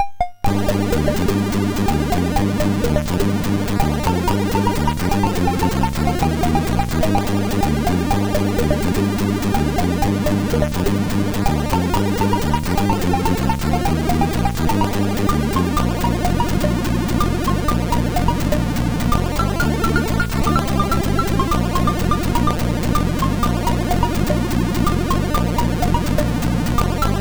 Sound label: MOS6581R4; DEMOS